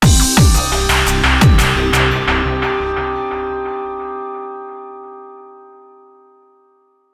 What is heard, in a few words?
DramaticEvent